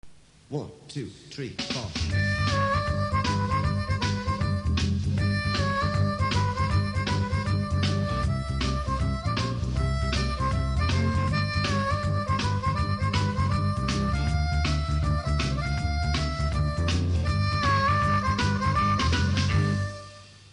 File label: harmonica